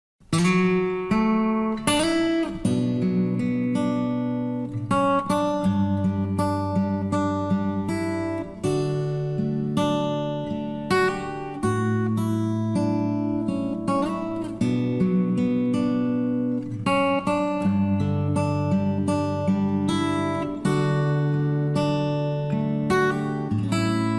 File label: guitar, ringtone